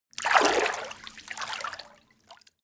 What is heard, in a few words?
water light